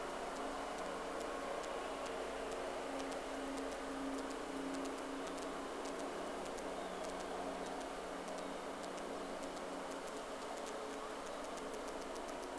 Whales; SeaSnake

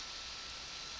Background Noise

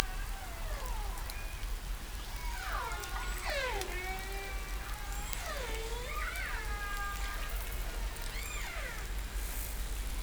hydrophones, lime kiln

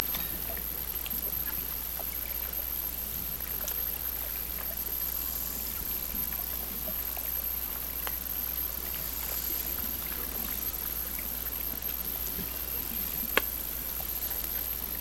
Hydrophones, orcasound

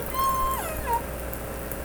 call S7 J version; Sea Sounds

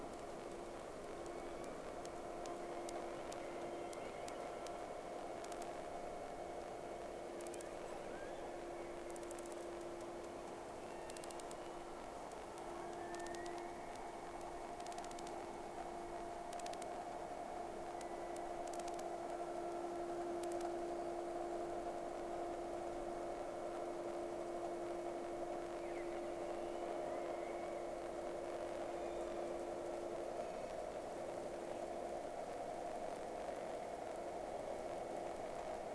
Whales; SeaSnake

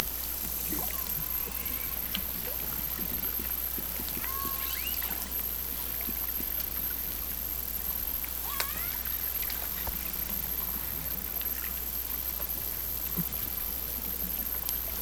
Hydrophones, orcasound

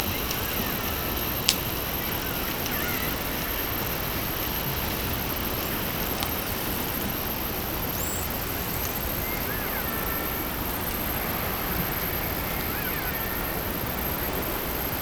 Hydrophones, orcasound

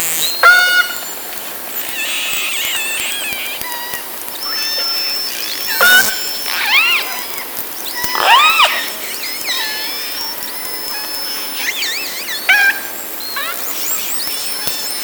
Orca sound Night Passby

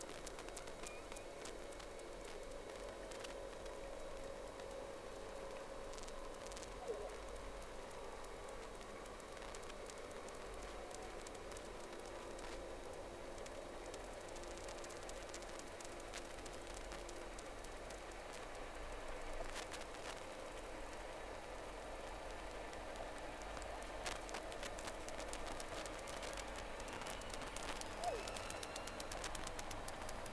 Whales; SeaSnake

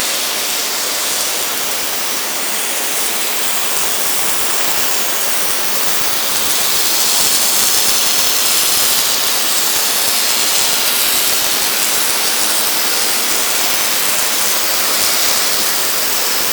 Orca sound Night Passby

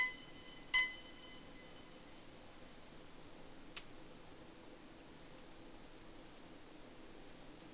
sound, Boat; SeaSnake

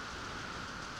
Background Noise

